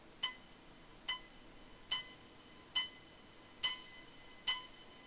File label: sound, Boat; SeaSnake